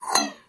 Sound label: disappearance, Magic